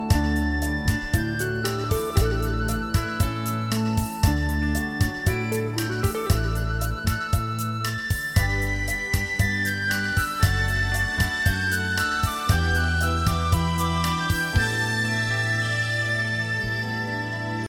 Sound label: dear santa claus music